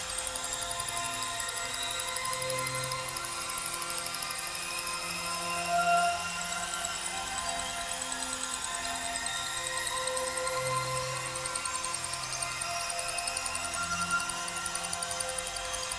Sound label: heal orb idle; cstrike; Valorant